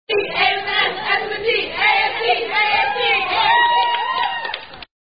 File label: Sorority Chant; AST Chant